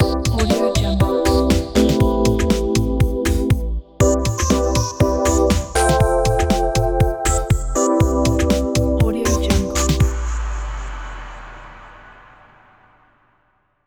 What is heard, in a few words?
logo ident intro